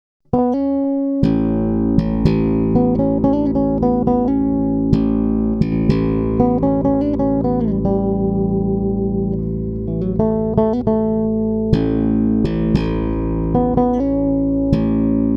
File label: JB bridge